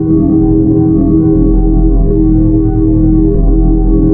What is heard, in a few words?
sci-fi forcefield hum loop; Space Wave Race